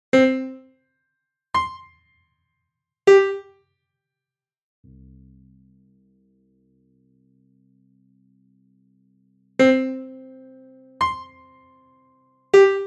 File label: Harmonics